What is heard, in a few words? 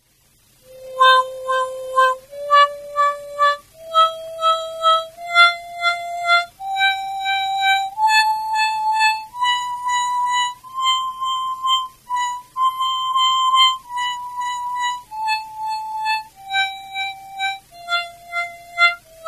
gamma, garmoshki